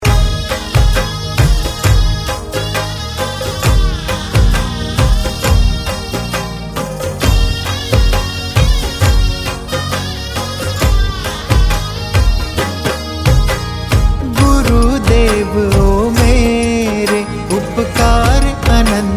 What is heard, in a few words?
Swagat Geet Rajasthani Music